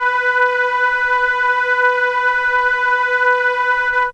Horn B4, ARP Solina; musicradar-synth-samples

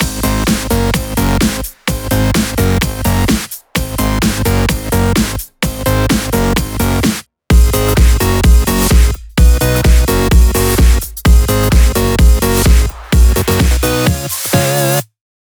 128BPM Mix; Vengeance Samples; VFH1 128BPM Flatbeat Kit

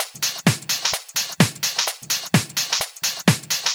House Loop, 128 BPM; Vengeance Samples

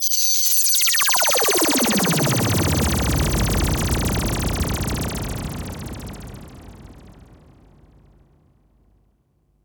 Lazers

